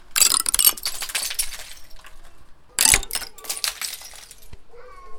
metallic balls falling into can

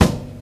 00s treble rich kick drum single shot f key

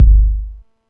tight lows steel kick drum sample g key

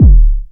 90s bass drum d key

